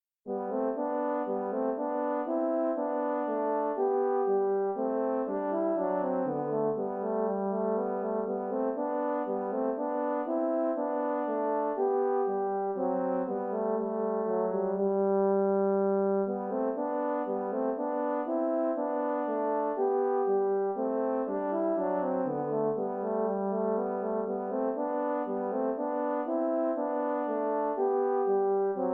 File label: bourree 2 horns